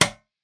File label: no ammo